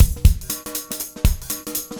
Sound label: Breakbeats loop, 70_s Breakbeats